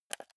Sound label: console controller joystick press fast